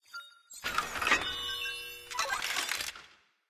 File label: scatter Up; cashomatic